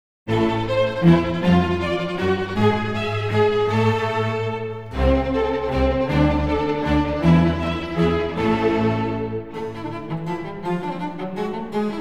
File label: Cello Concerto, for cello, strings & continuo in A minor, Allegro; Vivaldi; Cello Concerts